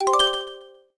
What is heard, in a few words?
Plasmaball; Lego My Style Kindergarden macOS Windows 2000